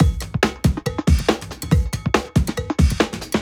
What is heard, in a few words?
Break Beats, 140 BPM